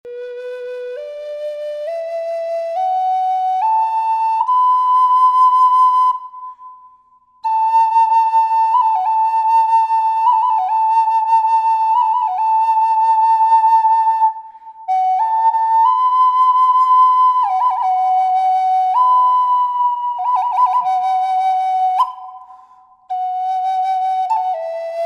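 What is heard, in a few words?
reverb, flute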